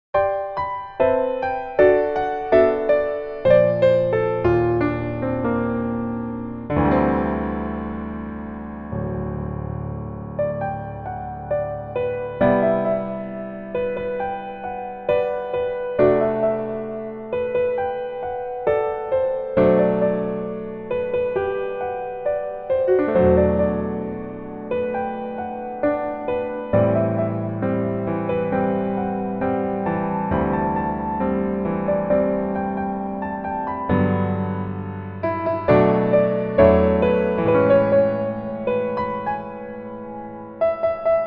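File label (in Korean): Piano version 멜로디MR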